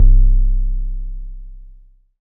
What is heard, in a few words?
Dance Floor Bass, Multisample Basses